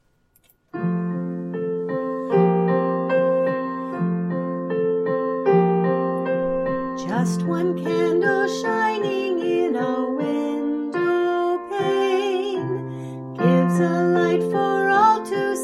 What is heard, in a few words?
Just One Candle with vocal